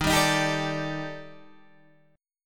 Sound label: D sharp Major 7th Flat 5th D sharp Guitar Half Step Down, chord